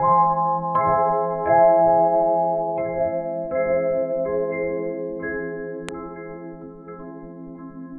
Chyme

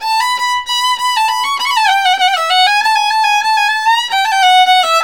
VIOLIN, Balkanish Traditions

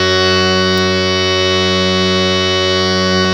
HARMONIUM, World Instruments

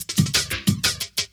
LOOP -L, Drum & Bass, 180 BPM; Ueberschall; Drum & Bass Resonance

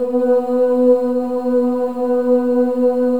OO B3, WOMEN; Spectrasonics

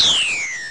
falling; Amiga Soundtracker Sample Packs

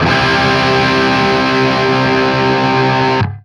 open C cut, guitar; East West; Steve Stevens Guitar Samples Collection

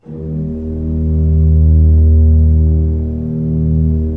16-8 D1, Cathedral Organ; Propeller Island

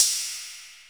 Ride; Equipped Music; Loungin House

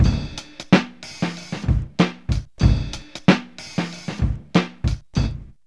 GRIT BEAT 94, Funky Elements LOOPS; Zero-G

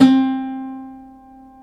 LUTH 2 C4, Arabian Traditions; USB Soundscan vol.43; AKAI

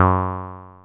ACID F#2; Best Service Alpha Dance vol.2

